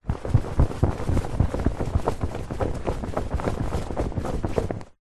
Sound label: foley clothing shorts thick cotton shake